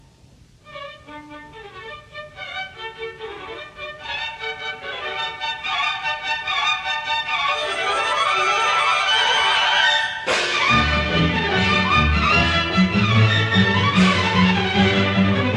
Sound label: Orch; Popeye